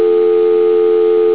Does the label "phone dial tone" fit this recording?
Yes